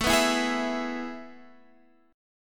A Major 7th Suspended 2nd, Guitar, Standard; AM7sus2 strummed; x,12,9,9,9,12; JGuitar

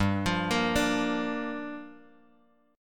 G Minor 7th, Guitar, Drop D

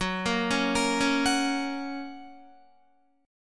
Gb Major, Guitar; Gb arpeggiated; JGuitar

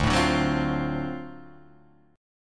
D 7th Sharp 9th D down Guitar Open D

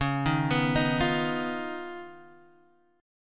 Eb 7th Suspended 2nd Sharp 5th, Db

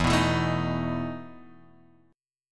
E Major 7th Suspended 4th E down

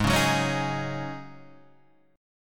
G sharp 7th Suspended 4th G sharp, Guitar Drop D

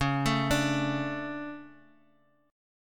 D 5th C sharp; D5/C#; arpeggiated; JGuitar

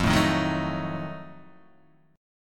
F 7th Flat 9th, Guitar Open D

